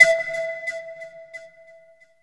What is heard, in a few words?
anulus, Synths